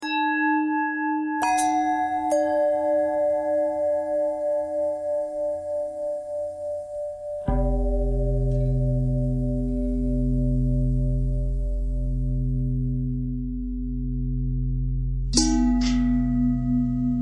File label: long bell bell thread pentatonic, bells